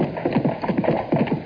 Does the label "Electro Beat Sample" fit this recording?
No